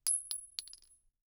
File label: Weapon Bullet 9mm Shell Drop; AdobeCC